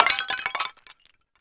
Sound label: clay pot; Shadowman